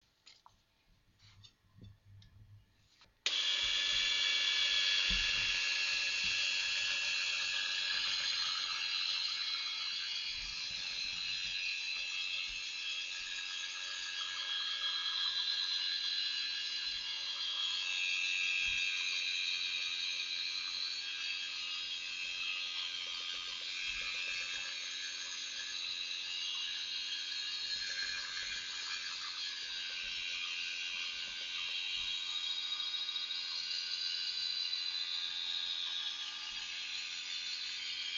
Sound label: electric beater